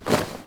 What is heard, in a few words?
spear holster; Rust